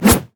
basicattack; renekton

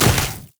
frost scorched earth; LoL; shyvana

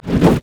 ancient golem basic attack, monsters; LoL

